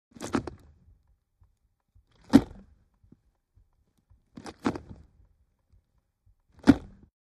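Mitsubishi Montero interior: Gear shift movement, 4 wheel drive knob shift; VEHICLES MITSUBISHI MONTERO: INT: Gear shift movement, 4 wheel drive knob shift.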